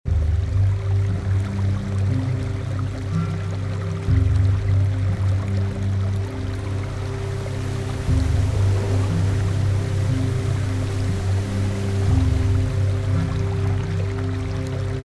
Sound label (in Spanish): Deep Aguas